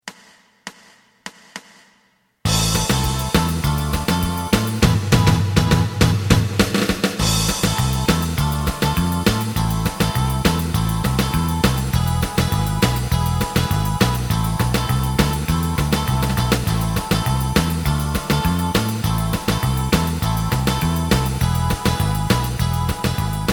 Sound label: lead